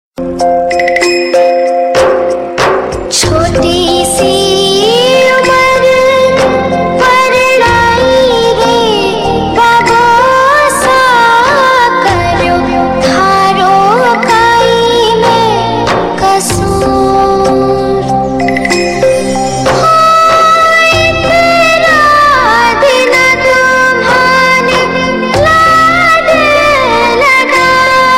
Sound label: Female; Balika Vadhu